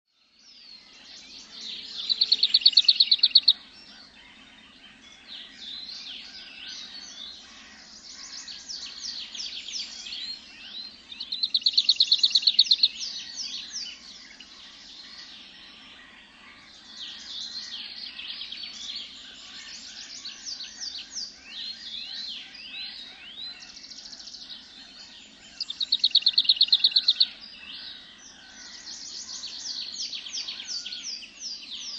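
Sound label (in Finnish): hernekerttu, lintuäänet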